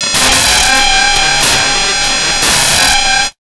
AMB 147 NOIS L, Big Beat Drumloops

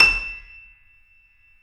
C7; East West; The Ultimate Piano Collection

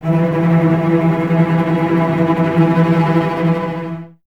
cellos E 3, String Ensembles, Tremolo; Miroslav Vitous

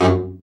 BRA BARI, Bariton Sax

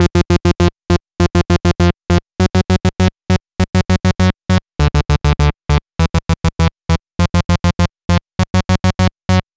Synth, Trip-Hop Loops

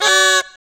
ETH INDIAN; AMG - Now CD-ROM (Roland); B.2.B. Fun

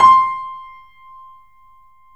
BPM L5 C, The Piano; InVision Interactive

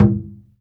HAIRY DRUM D4; Spectrasonics - Heart of Asia CD2

